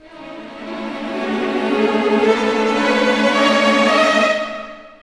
OPEN; East West; Scoring Tools; ORC; Open Theme

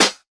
Snare, JUNGLE BREAKS

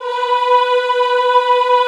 EHH B3, Classical Choir; Best Service

